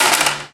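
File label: physics clipboard impact small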